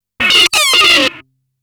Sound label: Aliens Monsters; FXHome